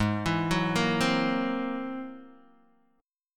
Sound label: G sharp Augmented 9th G sharp Guitar DADGAD